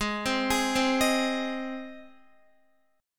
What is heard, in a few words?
Ab Major Ab, Guitar; arpeggiated; x,11,12,13,12,11; JGuitar